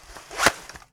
hk416 clip put; Escape from Tarkov

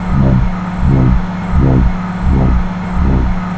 computer

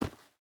footstep boots dirt run; Rust

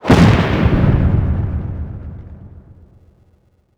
rocket explode far

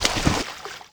water run medium

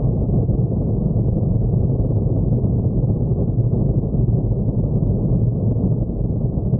Plane Gear Wind In; DCS

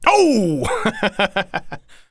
taunt hehe

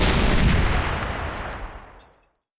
20 23mm tail close, guns tanks

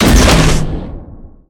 torpedo; World Of Warships

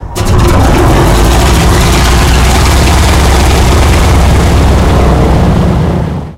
engine start; War Thunder

